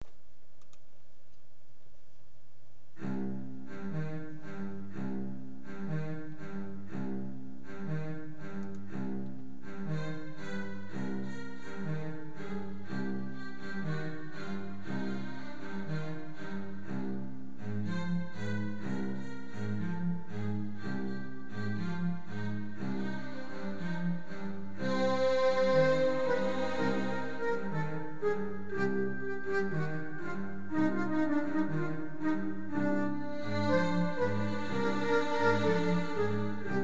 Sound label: Habanera accomp; Grade 10 Class Exam Solos; Xtras Classical Favorites